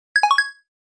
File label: Message sent